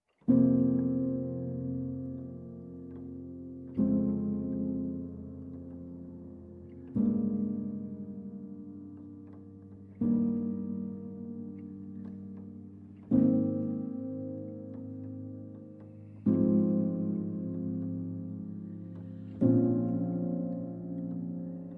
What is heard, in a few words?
Performed on Piano